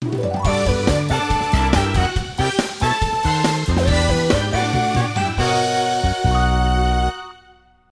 Jingle Score Start; Wii Karaoke U

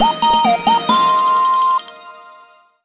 Jingle Minigame Start

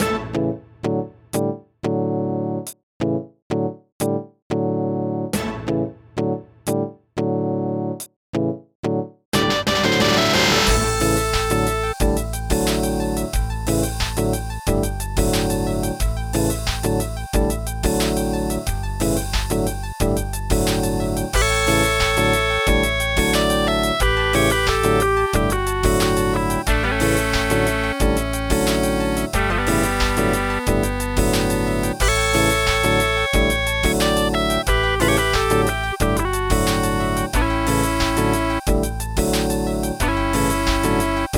Chugga Chugga Cha-ching; OpenTTD

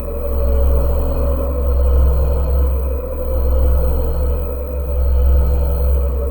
HERO BOOST LOOP; MONK RECRUIT

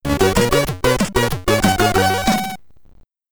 Start of Round SID Stereo; Trivia Ultimate Challenge; Commodore 64

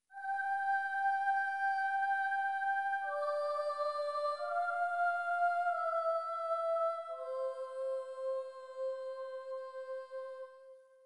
harper vocal long; dreamcast; Dragon Riders; Chronicles of Pern

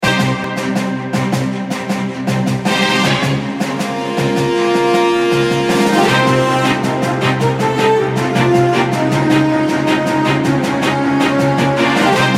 Bgm Fantasy

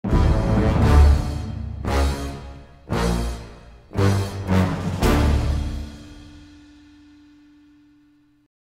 Foiled Again Sting; The Simpsons Game

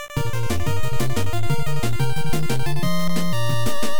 Player Goal

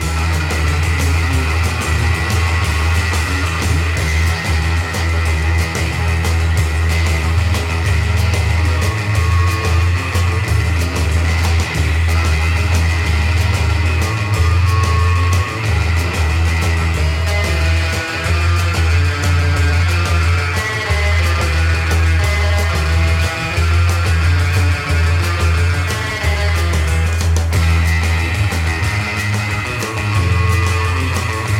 Hava Nagila; Linear Music

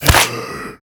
zombie death; guns n zombies 2014 pc

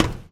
footstep metal low walk; ProSoundCollection v1 3 MONO

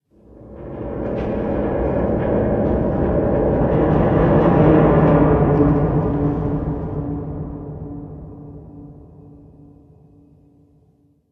Metal Distant Groan; Silent Hill Homecoming

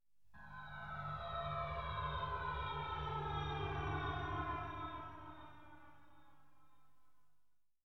Kaleev Teleports; Universe; CD32; Amiga

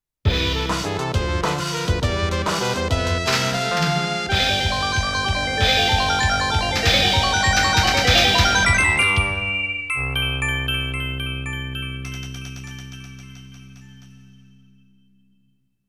Next Round BGM; pulirula

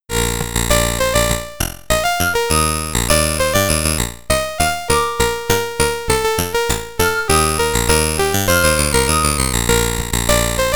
SID Stereo; labyrinth commodore 64

